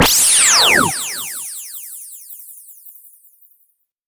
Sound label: laser